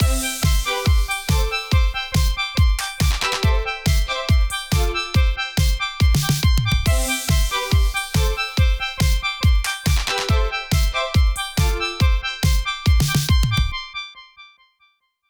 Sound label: Jingle; Lawn B' Gone